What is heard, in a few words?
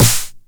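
909 SD Tape, snare drum; Goldbaby Tape808 & Tape909; The Tape 909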